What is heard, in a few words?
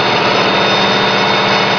run